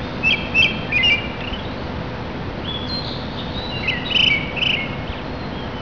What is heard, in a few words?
akahara; funagata